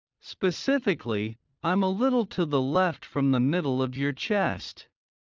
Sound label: Heart low; Classroom humanbody